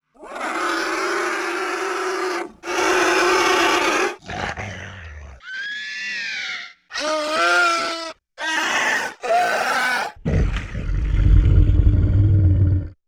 Lizard Creature, Vocals - Monster; Sony Pictures Sound Effects Series

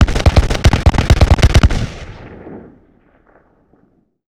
Musket Volley Close; Period Combat; Sony Pictures Sound Effects Series

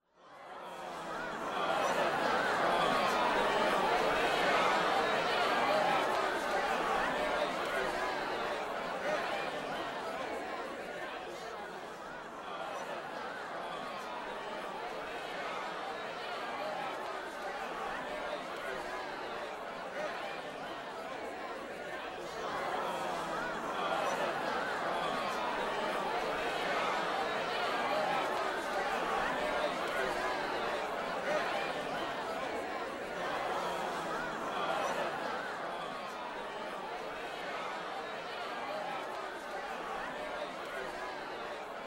Grumble; Age of Empires III; cinematics

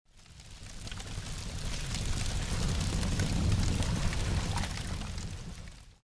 Fire Ship Approach; Age of Empires III; cinematics

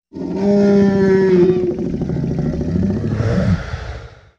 Dinosaur Design, Vocals - Monster; Sony Pictures Sound Effects Series

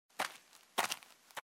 Footsteps; Age of Empires III; cinematics

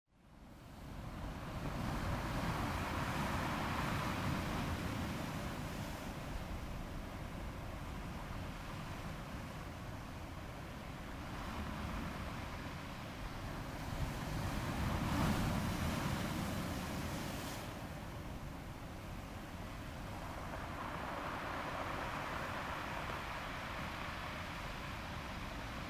Ocean; Microsoft Studios; Age of Empires III - Complete Collection; cinematics

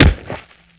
Kung Fu Old School Hit, Hand To Hand Combat; Sony Pictures Sound Effects Series

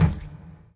book, item; RYL 2

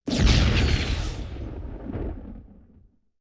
WWII Explosion With Flare; Sony Pictures Sound Effects Series

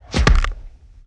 Swipe With Bone Crack, Hand To Hand Combat; Sony Pictures Sound Effects Series

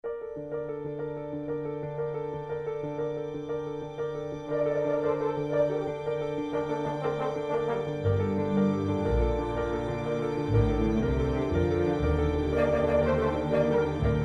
Music

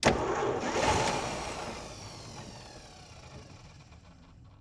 Plymouth Failed Start; Sony Pictures Sound Effects Series; Period Vehicles

